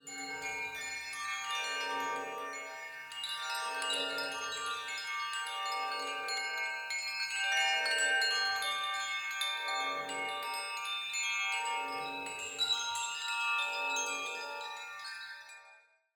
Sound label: Bell Chimes, Atmos, Dark Magic, Unsetting, Magical, Cymbals; 344 Audio; Cymbals From Hell Vol 3